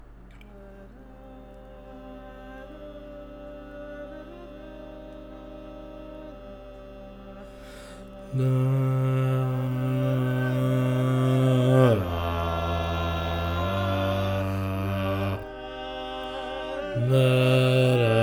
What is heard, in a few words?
Bass, barbershop tag; Each recording below is part predominant - one part louder, other parts quieter.